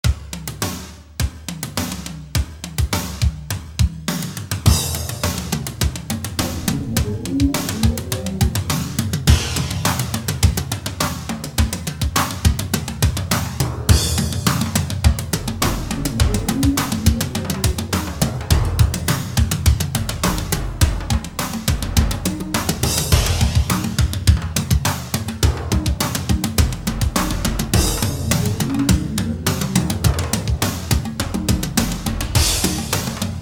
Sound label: Drumrun; Halo Combat Evolved